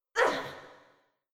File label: Princess Heavy Damage VOX